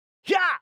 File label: attack; BELMALE